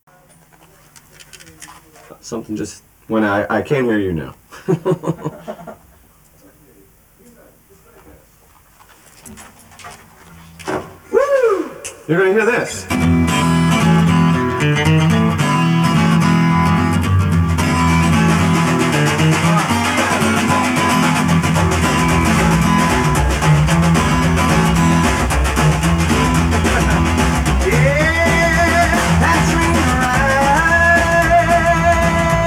acoustic version

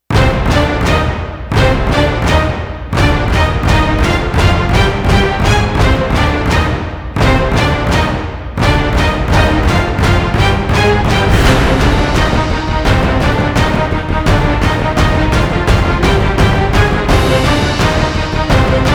no choir

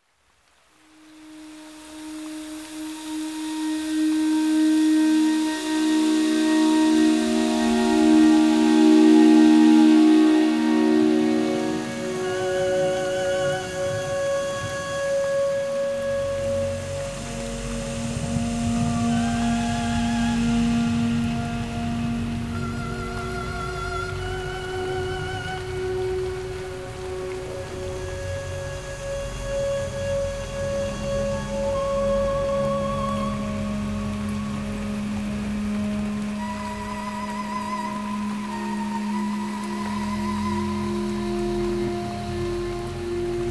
tropical thunderstorm

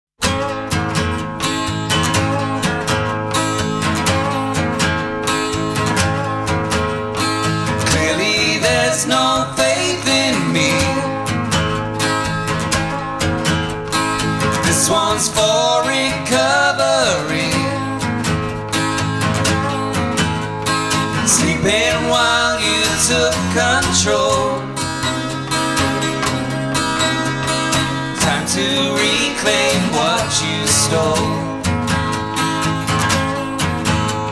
acoustic

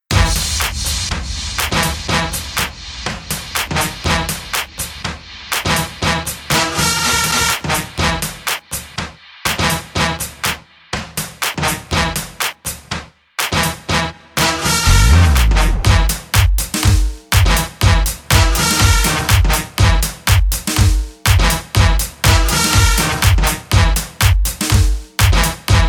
swing

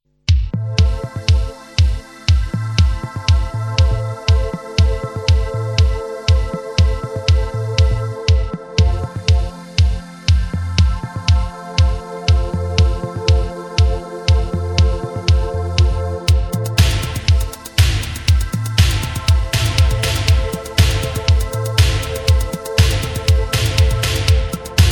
space synth

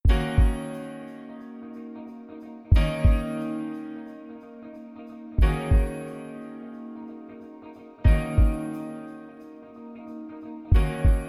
soul keys

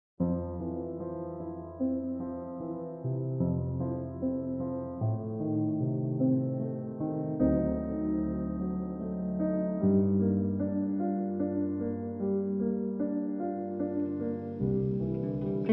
instrumental guitar music; when it rains for inner peace

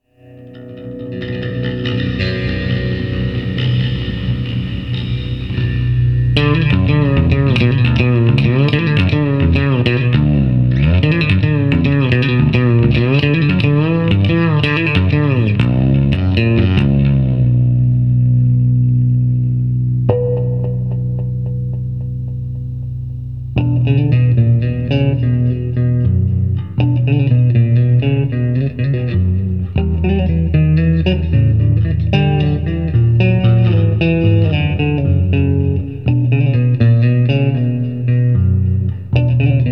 romance bass solo

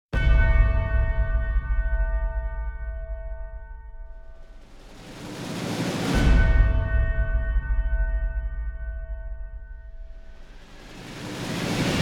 drums build

